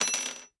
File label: piano fix